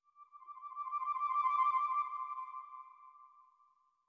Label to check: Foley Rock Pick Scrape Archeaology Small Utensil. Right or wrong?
Wrong